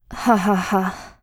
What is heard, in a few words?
laugh; Eternal Return; Katja; ENG